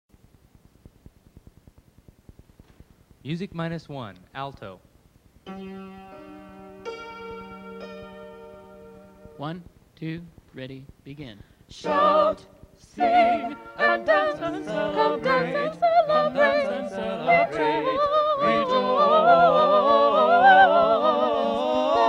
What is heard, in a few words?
praise the lord alto, choirs